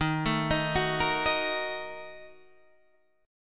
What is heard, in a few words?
A sharp Major 7th Suspended 4th Sharp 5th